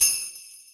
kit, Yamaha Motif-ES8

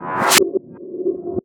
Reverser; Effects; Electronisounds

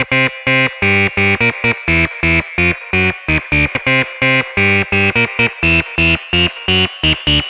128 Fm 303 style, Melodic Loops; Electronisounds

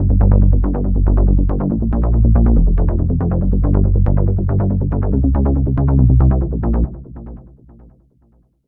Clubber Bass; Dr. Fink's Funk Factory

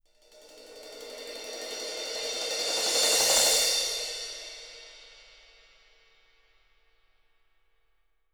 CYMBALS; Sampleheads; Peter Erskine Living Drums; SD CYMBALS

